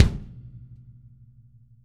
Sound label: KICK, Drum Kits